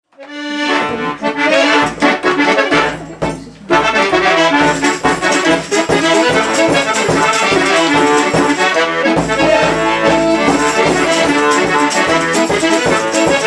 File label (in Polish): Polka pod nogę